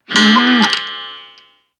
flying bird bird feathers start

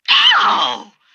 vox pain growl

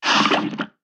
Potato Spit

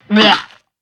level bird stretcher garbage

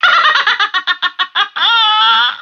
vox laugh big

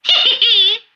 vox laugh small

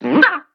flying bird spit

